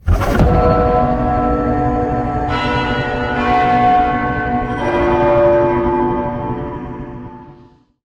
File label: Bell obtainment